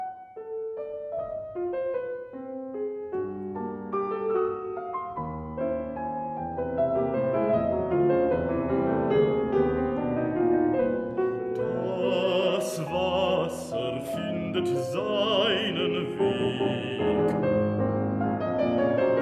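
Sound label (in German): 4 Lieder Bariton & Klavier; 4 Lieder nach Texten von W.P. Schnetz Bariton & Klavier; Katia Bouscarrut - Klavier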